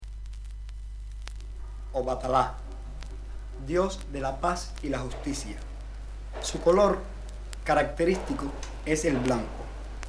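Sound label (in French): Prières yoruba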